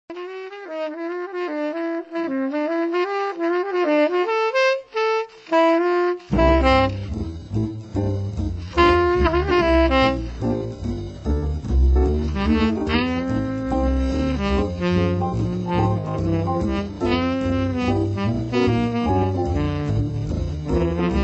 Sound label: ad lib blues slow